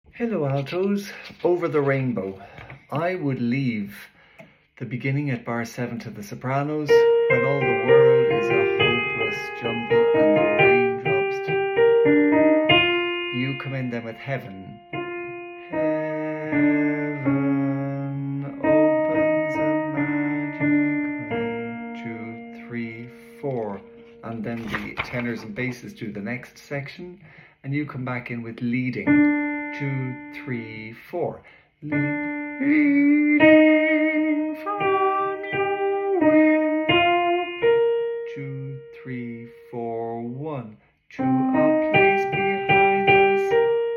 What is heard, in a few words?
alto